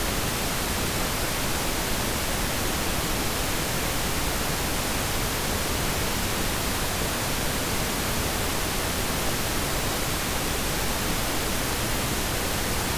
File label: pink noise; ピンクノイズ